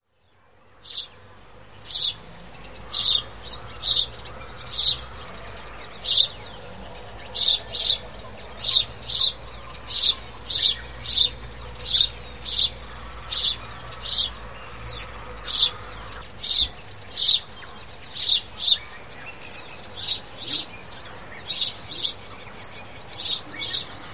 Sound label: sparrow house, bird calls